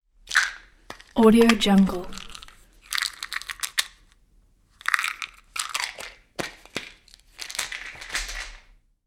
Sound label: plastic bottle